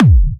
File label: Bassdrums UK Trance Kicks; Vengeance Samples